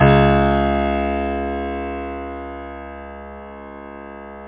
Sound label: Piano C#1